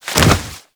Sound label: Generic Roll; Warframe; Characters